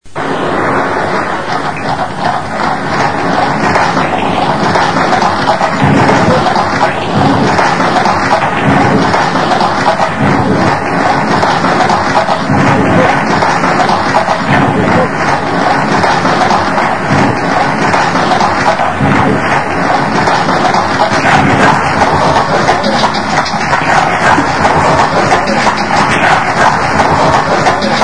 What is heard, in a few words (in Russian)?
loshadi po mostovoi